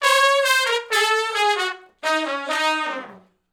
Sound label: R B Riff (Eb) unison, Horncraft; Sections